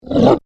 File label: Monster Grunt, Horror, Monster, Grunt, Creature; Monsters